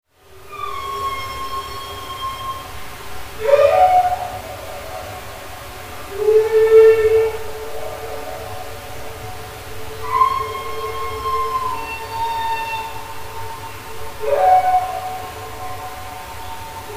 Humpback; NOAA

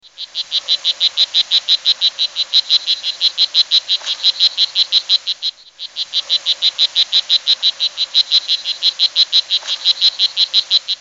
Red Poison Dart Frog; Naturesongs

